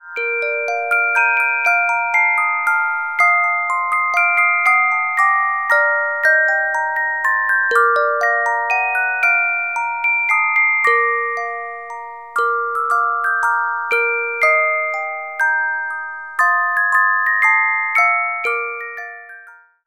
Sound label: Carillon Music Box Melodies